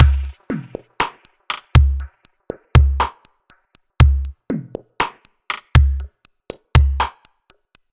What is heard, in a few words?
Half Time, Drum Loop; Half Time Drifter; Sonic Foundry (Sony Creative Software); The Electronic Music Manuscript Collection; Drum Loop Structures